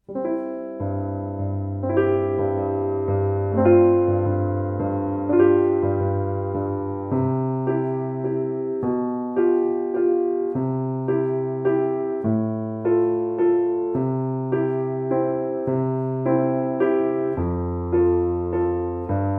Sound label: Beginner 105 BPM, violin